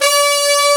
SAX C3; AKAI S-Series CD-ROM Sound Library VOL-2

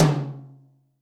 tom, Zoom MRT-3B

